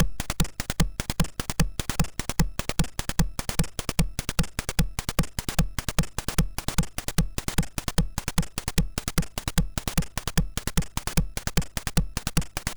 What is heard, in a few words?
Breakout Remake, sid MOS6581R4